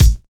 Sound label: acoustic steel kick drum sound a key; Duration 0:01; Channels Mono; kick drum samples